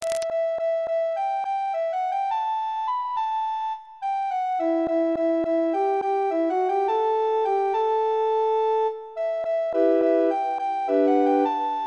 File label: Kalimba, recorder